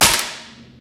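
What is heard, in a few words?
physics clipboard impact large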